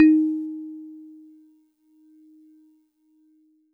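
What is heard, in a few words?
Celeste DX100 D#1; Pristine Celeste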